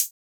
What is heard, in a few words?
drum hit normal